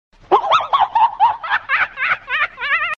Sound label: laughing